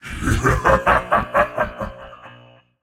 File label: laugh, voicepack; smite; jorm